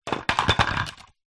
household water sprayer bottle plastic small knock over garage floor some room; reverb